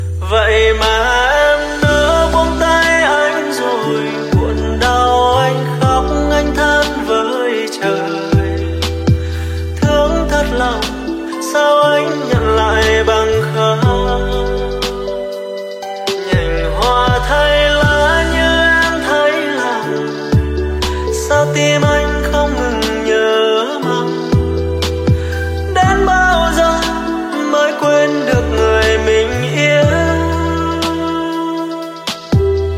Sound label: lofi